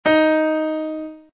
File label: dis1 es1, keyboard sounds